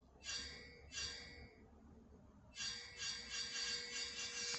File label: danger ping